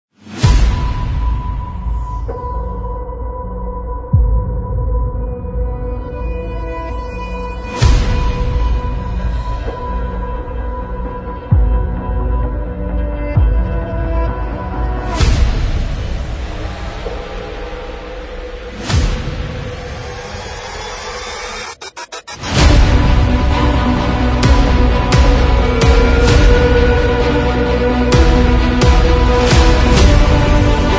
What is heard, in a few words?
Epic Hybrid Cinematic Trailer Full Track